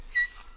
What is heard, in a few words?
bonobo peep feed